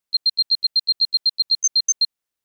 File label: Command Sound